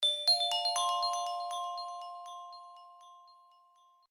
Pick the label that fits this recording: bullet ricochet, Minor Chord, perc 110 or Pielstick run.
Minor Chord